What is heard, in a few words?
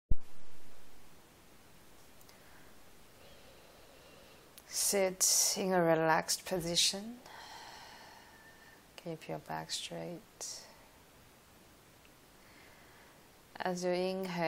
flame